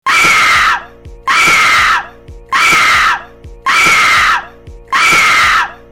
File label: screams x5